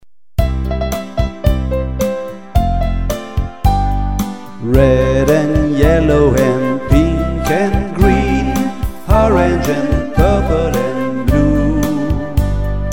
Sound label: vocal